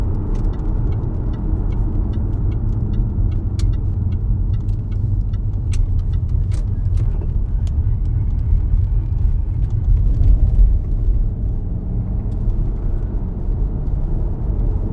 rotating grinding noise only right turns, xk right turn noise